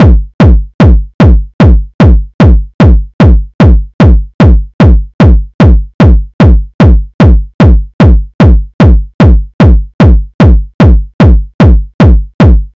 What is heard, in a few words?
loud techno drum